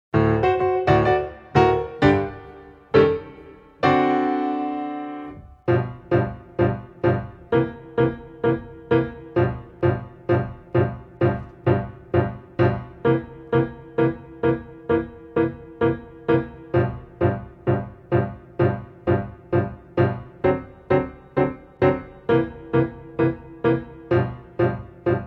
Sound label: Improv Track